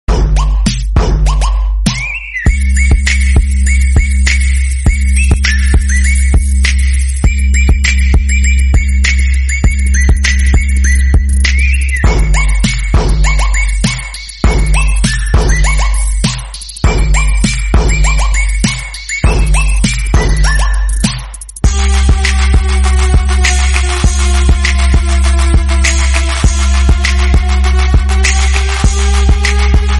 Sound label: Jingle Bell Christmas Hip Hop Remix